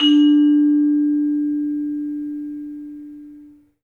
VIB; 3D Sound; 3D Keys VOL-1; 3D VIBES

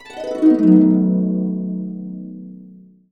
B, Harps; USB Soundscan vol.62; Complete Harps; AKAI

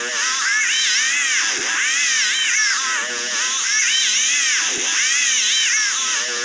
STRETCHER; Spectrasonic Distorted Reality 1

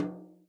tom, Real Drum Kit, jungle kit

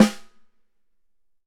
Ludwig Snare; Digital Sound Factory

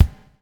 BUBL, BUBBLE; Spectrasonics - Backbeat

